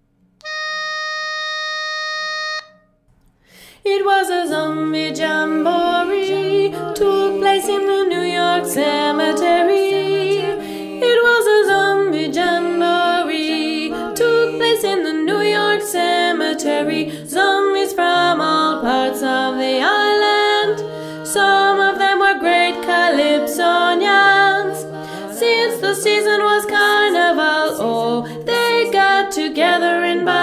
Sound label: lead